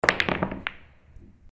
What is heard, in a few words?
break, 8 ball; agent 8 ball